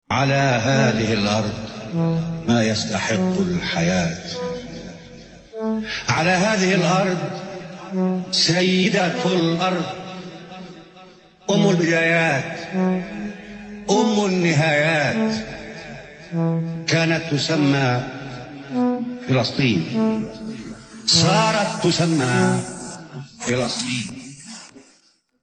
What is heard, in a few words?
vocals